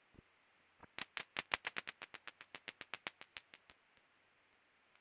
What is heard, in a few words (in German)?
Ausflug abends - Mausohr Ortungsrufe Vorbeiflug (HD ca 30kHz - Echtzeit)